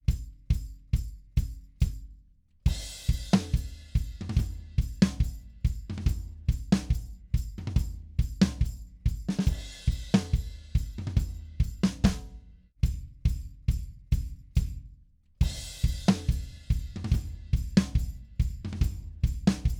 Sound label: drums; psp 2445